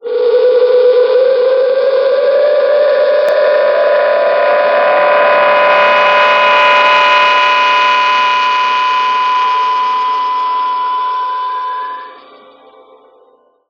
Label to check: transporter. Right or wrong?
Right